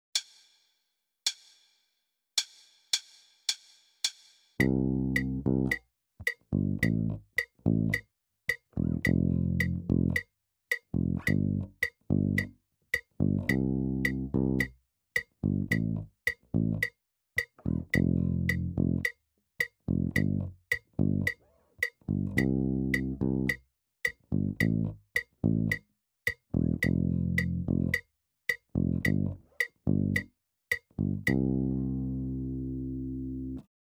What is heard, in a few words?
Rock